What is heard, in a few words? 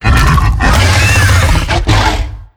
queen charge start; Attack of the Radioactive Thing